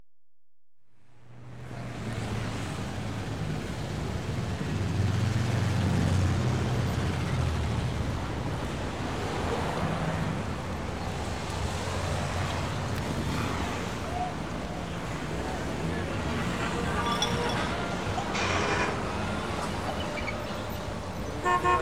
Traffic At U.S. - Mexican Border; America; BBC Sound Effects Library

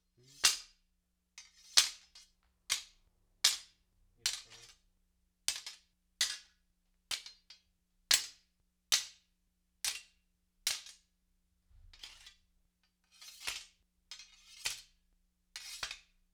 Sabre Norse Sword Hilt on Blade; StillNorthMedia

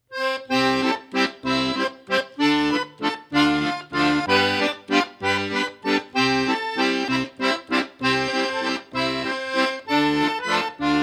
Windy Old Weather

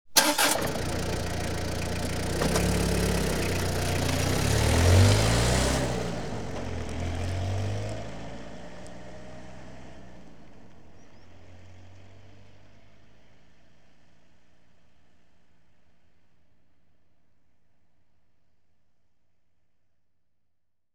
Peugeot, 1-9 L Diesel, Exterior; Auto; BBC Sound Effects Library

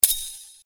Sword Sound; FXHome; Fighting Sounds

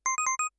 power up; Kenney's Sound Pack; Digital Audio

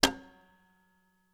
Old Metal Clock; 99Sounds; The Warehouse

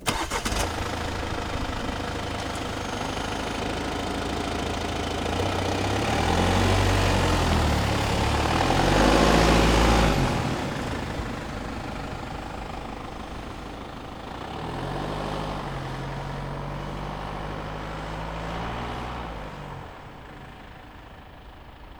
Mini Countryman F60 SD EXT DRY start drive away; Soundholder

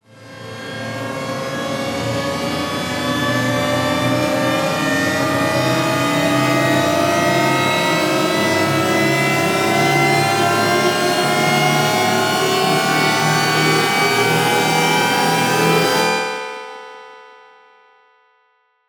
Riser, Effective Trailer Risers; Federico Soler Fernandez

